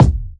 dry kick drum one shot c sharp key

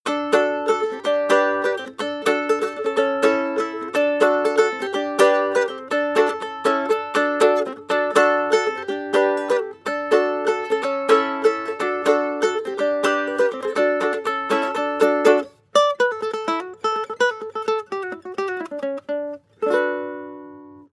guitarrico country sequence; loops

